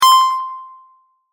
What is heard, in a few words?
Beep Simple Delay, A Simple Beep With Additional Delay; Multimedia; Crafted Signals